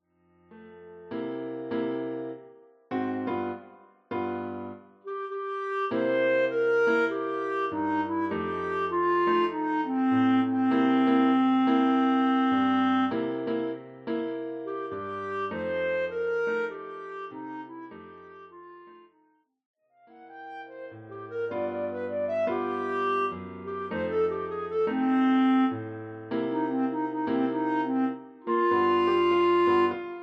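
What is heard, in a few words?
bass clarinet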